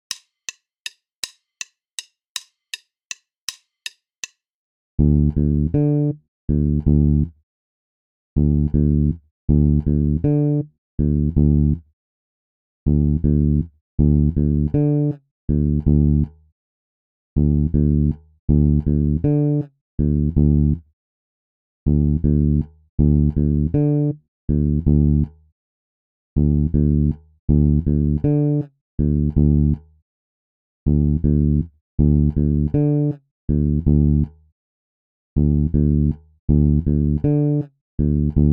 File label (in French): decalage triolets exercice basse tempo 080